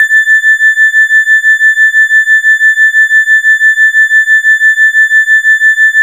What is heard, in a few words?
E HARP R, DX HARP